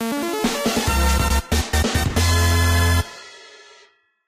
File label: fanfale intro; Cave Story Repainted